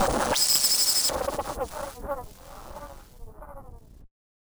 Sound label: Malfunction Synth, malfunctioning synth samples